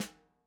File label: snare, gretsch kit